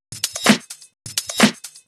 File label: Electro Loop 128 BPM; Vengeance Samples